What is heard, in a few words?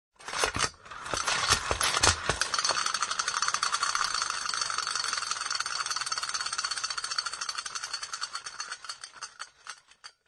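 Spinning top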